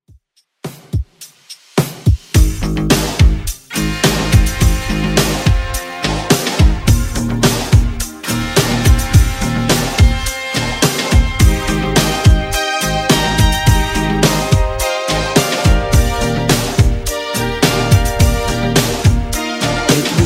80s Redrum 106bpm

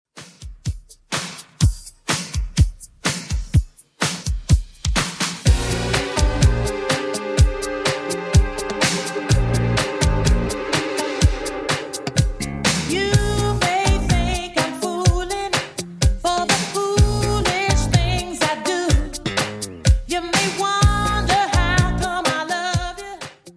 80s Redrum 125bpm CLEAN

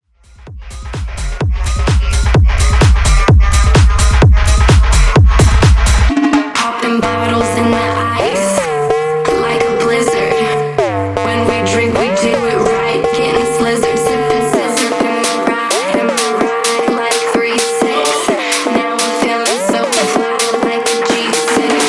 Basshouse Mashup Edit 128Bpm; djyan

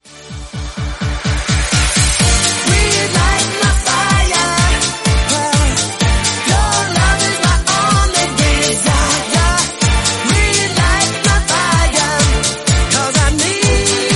126bpm Dm

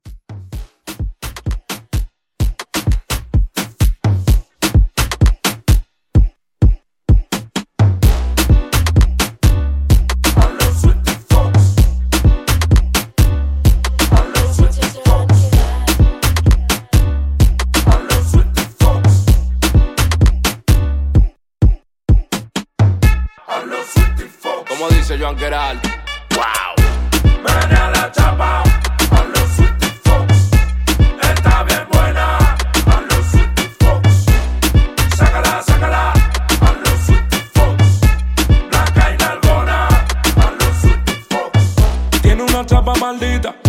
Afro Mixshow 128

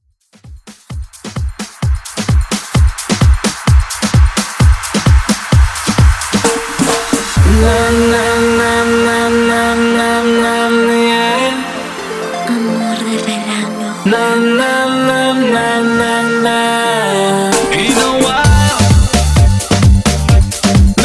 Redrum

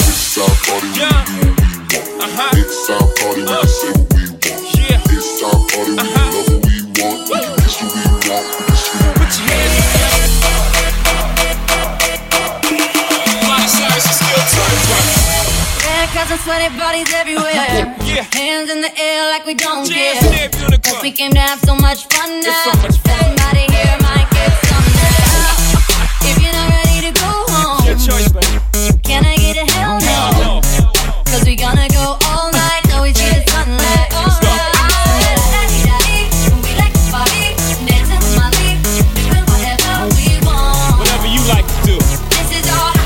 REDRUM 95bpm Dbm

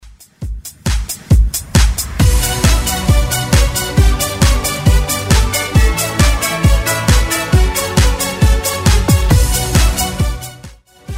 90s Redrum 135bpm

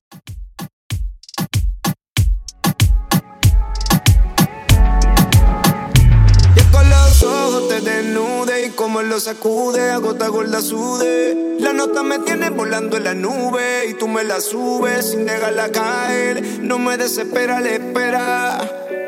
Latin Reggaeton 95Bpm

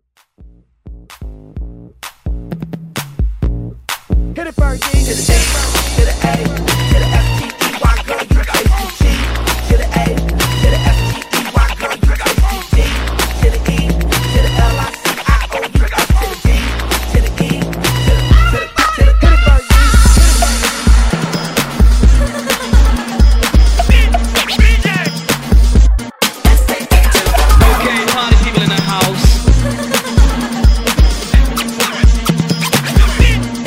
Throwback Scratch

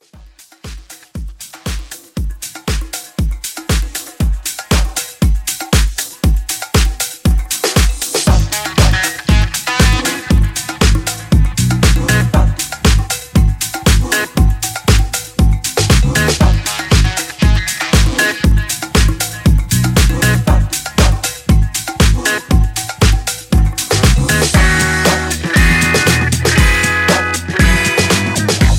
70s Pop Edit 118

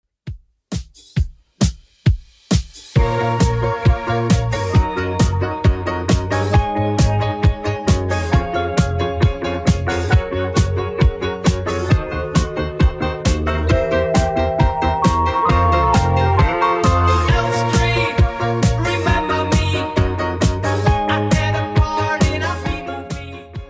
80s Redrum 134bpm

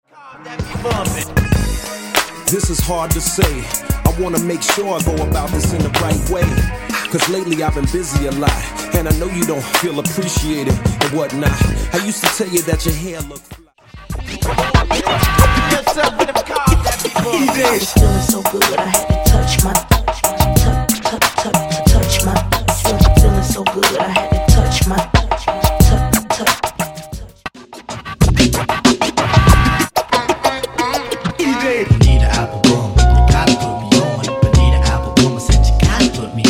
Funk Megamix

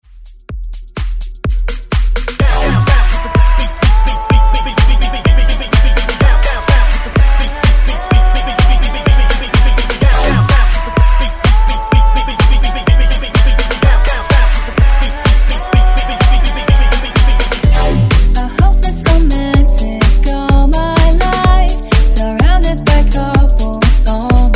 House Redrum

